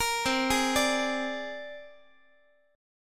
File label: C 7th Suspended 2nd Sharp 5th C down Guitar; C7sus2#5 arpeggiated; JGuitar